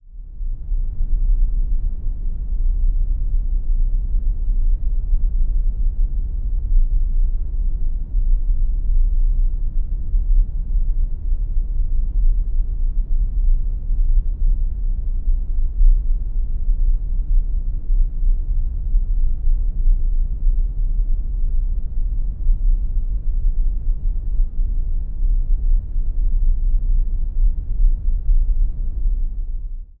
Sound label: Third Octave 30Hz; subwoofer